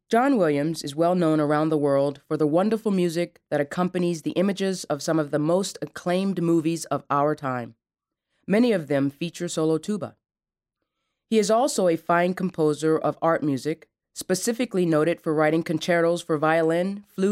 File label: tuba; classical